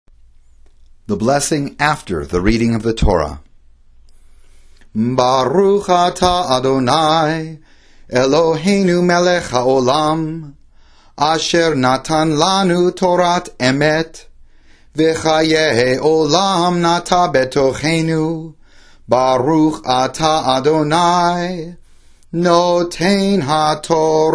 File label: blessing after torah chanted